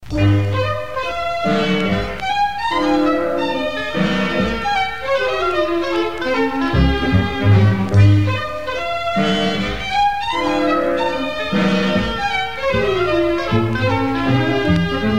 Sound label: Light Mechanical